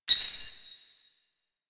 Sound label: Night Bird, Beach; Ambience; Warframe; Grineer Beach